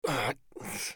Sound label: bear wound; eft